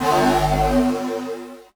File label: Transition Distortion Power Source; Production Element Title Transition